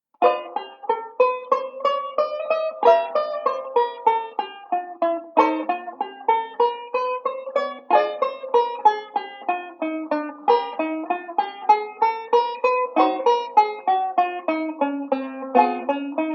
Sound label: banjo; Beyond Chord Melody Volume II